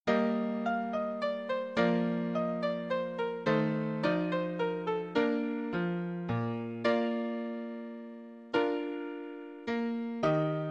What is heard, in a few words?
Alto Acc Faster